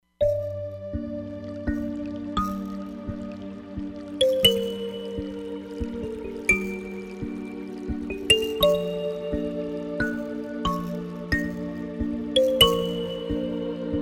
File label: Quiet River, Roland Fantom XR